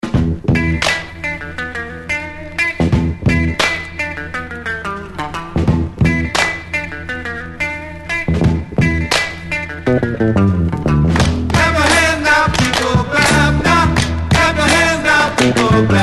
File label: Hand Clapping Song